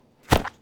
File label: Jumping On Grass